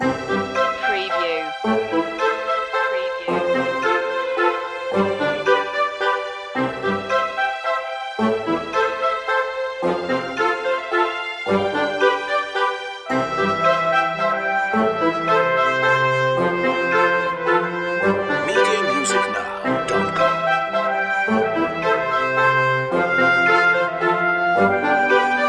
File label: the clockmaker waltz loop